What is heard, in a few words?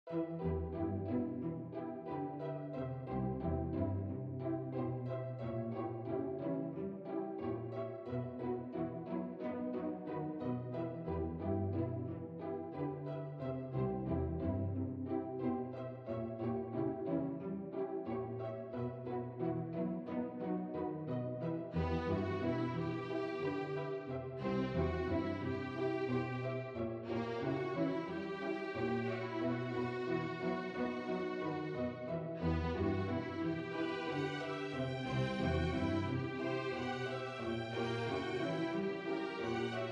String Orchestra; Arioso